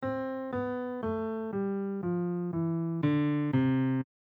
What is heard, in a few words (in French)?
illustration octave descendante